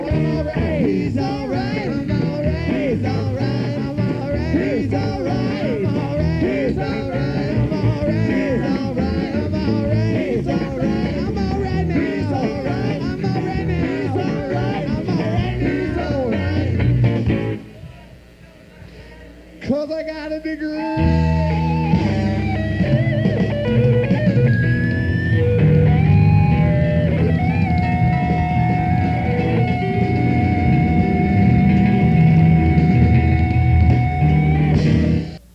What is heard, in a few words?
Blues